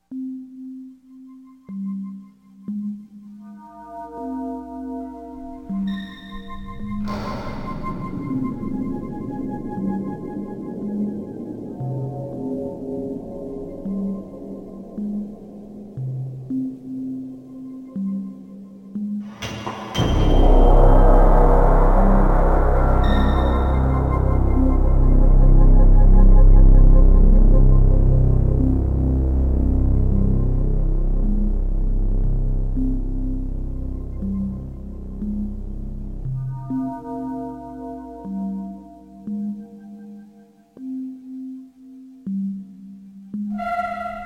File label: Police Station